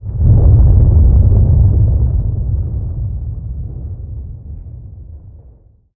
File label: mburer attack; STALKER; customnpcs